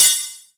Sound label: Hats, Elektron MachineDrum